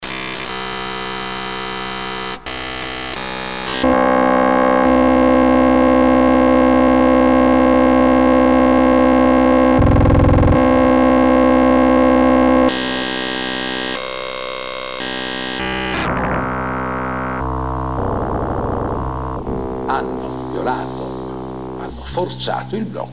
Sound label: inner voices